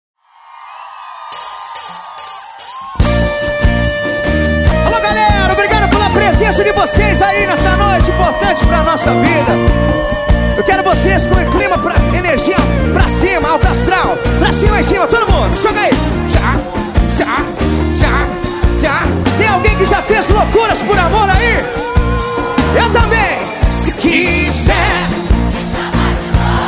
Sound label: drums